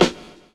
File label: SNARE; E-Lab; X Static Goldmine 2